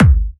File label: BD Trancy; Club; VEC1